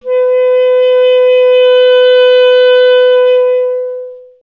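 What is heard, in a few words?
wind clarinet B; Miroslav Vitous; Solo Instruments VOL-1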